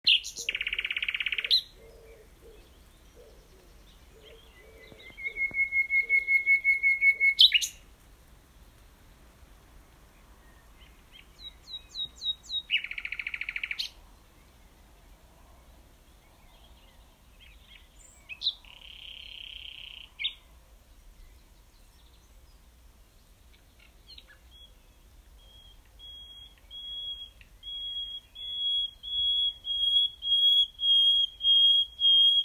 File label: Nightingale